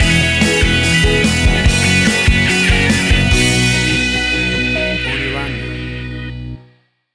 Stinger

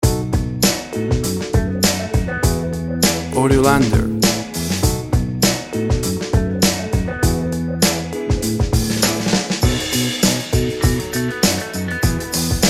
easy funk

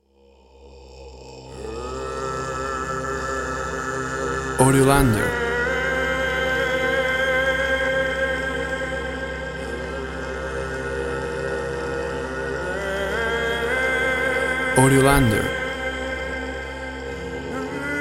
Voice Soundscape

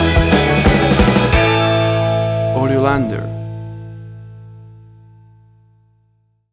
Fifties Jukebox Moods Stinger

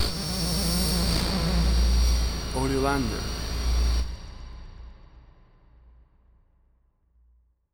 Alien Scream, The classic mid pitched Alien Screm; The classic mid pitched Alien Screm which is great as a short stinger piece of audio to repre; AUDIOLANDER

